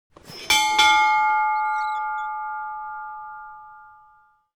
Bell

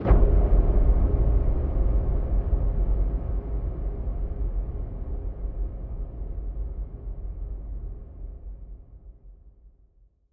Incinerator Firstboom; sundered eldritch edition; windows

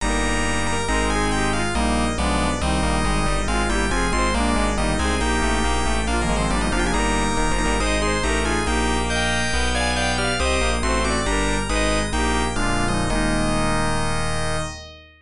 Area Clear; Legendary Wings; arcade

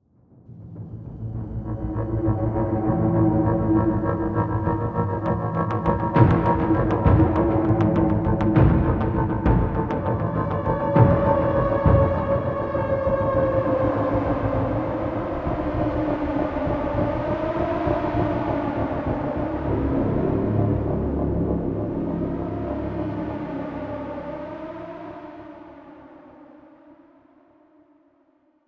Suspense

